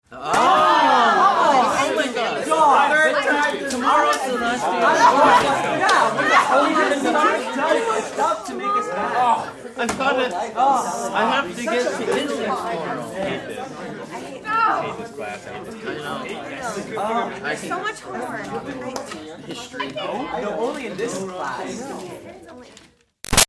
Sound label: Crowd Highschool Class Awwh Big; Backgrounds; Big Room Sound